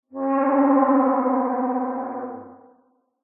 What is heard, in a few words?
animals dinosaur distant groan call